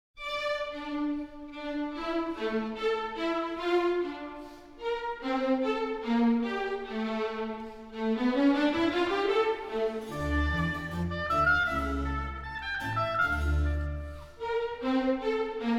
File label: Oboe Concerto In D; Marcello A